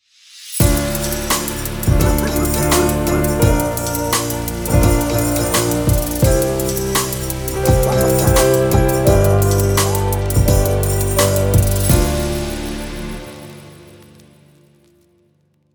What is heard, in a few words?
Piano Hip Hop Intro Short; Stereo Nuts